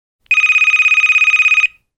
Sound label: Phone; Soundzabound